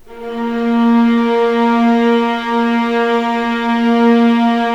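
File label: A#1 legato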